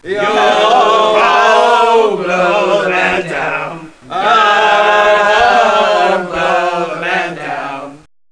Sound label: PIRATE SONG